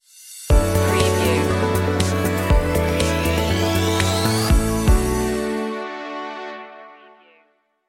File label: a perfect pitch stinger